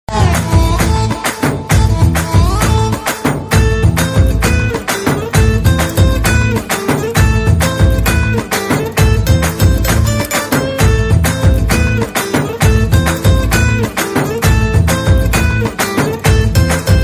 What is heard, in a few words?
Instrumental Tone